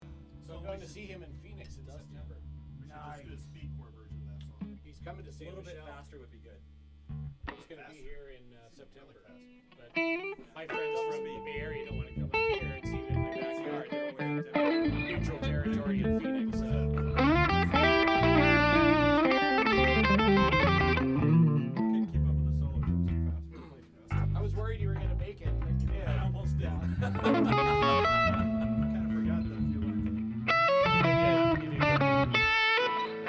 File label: Strings Only